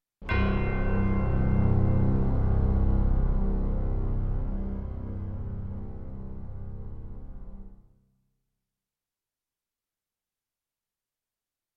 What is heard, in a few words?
Intro, Roland JV-1010; Dark Forces